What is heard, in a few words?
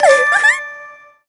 surprise; rola